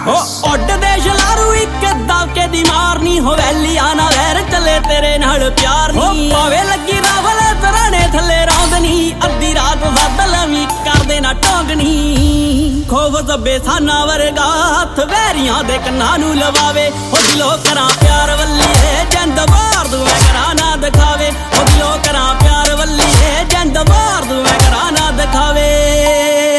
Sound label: punjabi ringtone